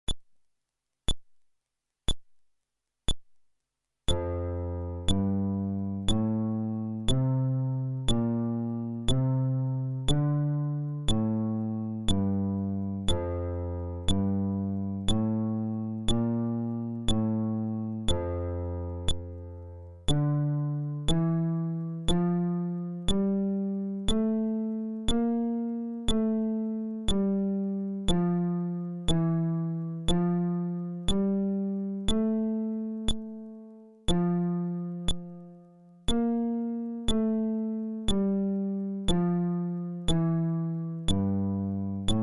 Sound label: Scale, fretless bass